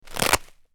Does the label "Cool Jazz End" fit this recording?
No